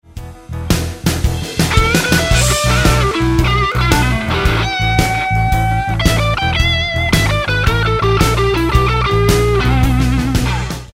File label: Roadhouse Licks Lick, guitar; 20 Roadhouse Licks